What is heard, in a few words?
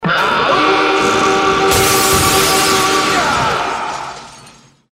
horn; hl2dm; oppressive territory; mask